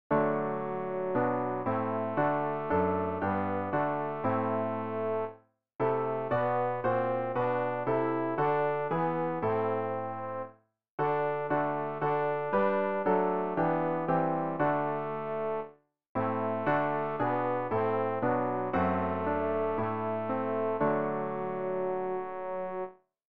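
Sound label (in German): tenor, Übehilfen; Ref. Gesangbuch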